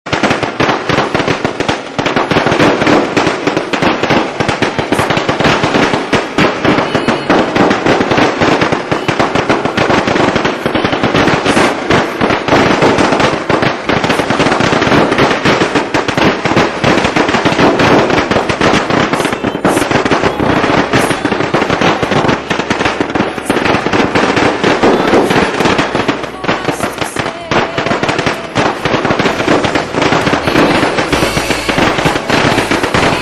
firecrackers sound effect

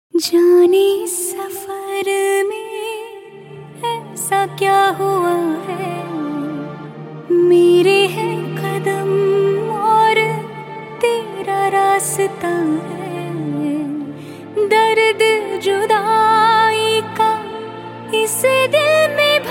bollywood hindi sad; main aur mrs khanna; sad songs

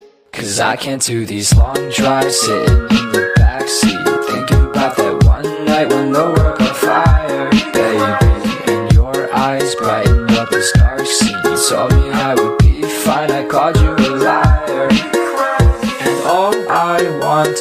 Long Drives melody; India

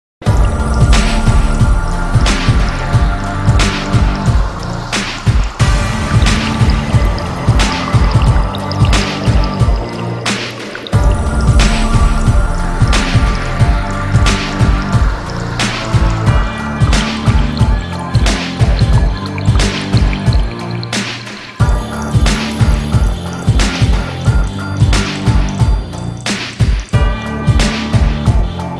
super dj effects

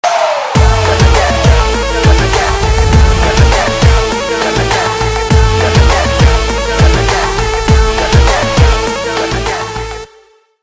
music

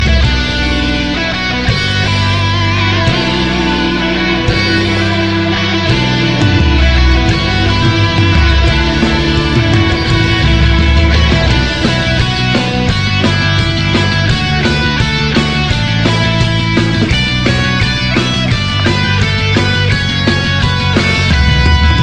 guitar metal rock theme; Metal Gear Solid

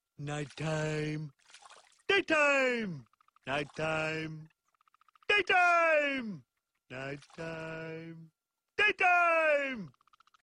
animals nightime, ringtone; bbc animals nighttime daytime

